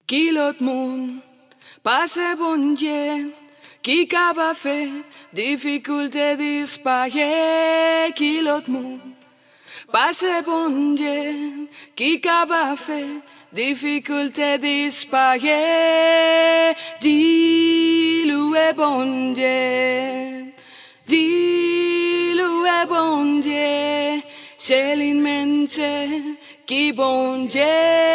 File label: alto